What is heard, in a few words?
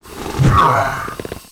STONE BLOCK WAR ATTACK